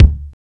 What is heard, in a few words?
prominent kick drum sound d key